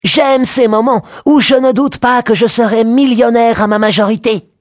bruit

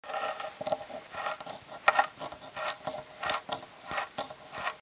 stylet

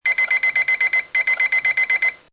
radio reveil; MOBICLIC 65

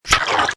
plouf; MOBICLIC 72; ARRIBA

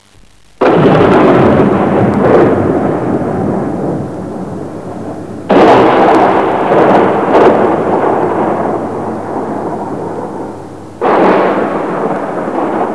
Foudre; Nature

